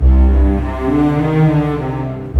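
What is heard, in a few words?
100, orchestra arpeggi; East West; Scoring Tools; Myst Arpeggi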